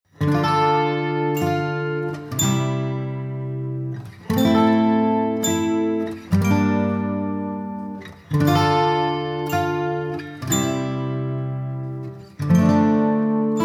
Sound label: Polar Wind Instrumental